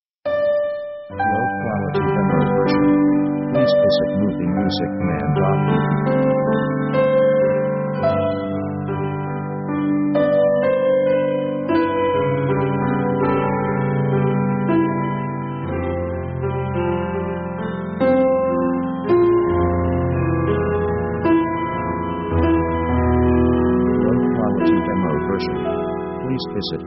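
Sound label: orchestral romantic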